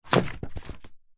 household mail bills greetings cards pile land floor post; zapsplat